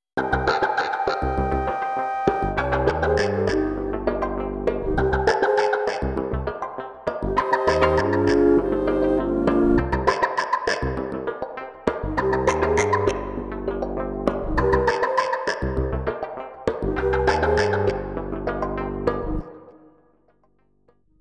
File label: drum